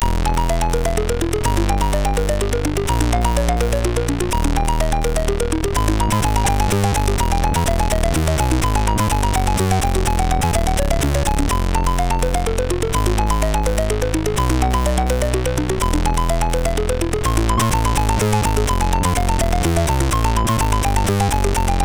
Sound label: sid MOS6581R3